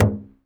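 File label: UGANDA drum, Heart of Africa; Spectrasonics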